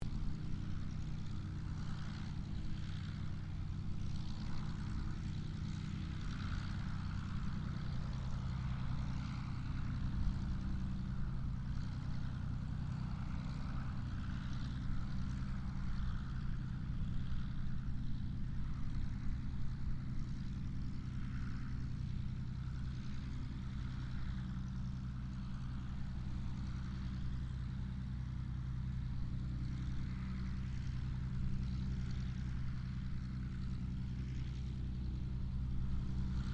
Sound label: AIRCRAFT P-40 KITTYHAWK IDLE DISTANT; P-40 Kittyhawk / Warhawk / Tomahawk; Airplanes; Vehicles